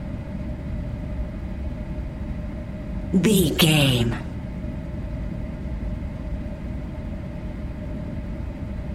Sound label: background air vent fan loop